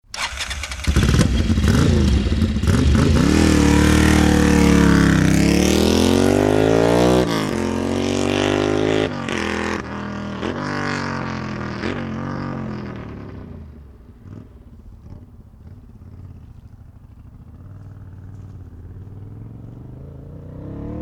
Motorbike Ducati 350 Start Away Up and Pass Tick Over Off; Up and pass 3 times; Motorcycle